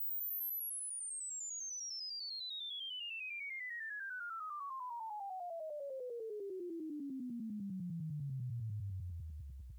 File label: mic sweep